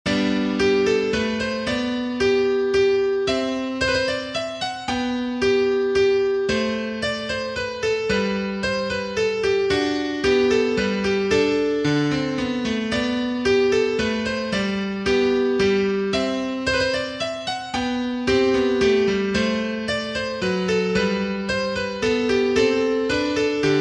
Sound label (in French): Menuet en Sol Majeur; Bach